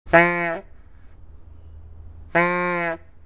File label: 16inch large loop squeeze horn